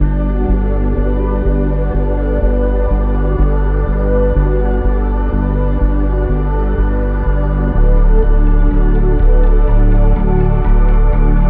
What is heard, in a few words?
Ambient; Ambient Vol 4 Music Pack